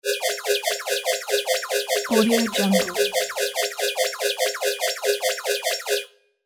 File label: futuristic machine calculations; mountainaudio